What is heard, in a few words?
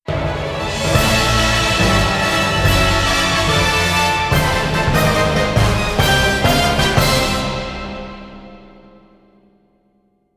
ジングル Final Mission completed; EDF6